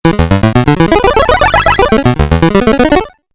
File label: 8 Bit Style Crazy Melody; Video Game